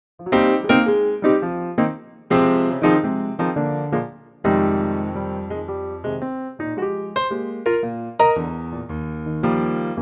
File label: Eb piano; key - Eb - vocal range - Ab to Db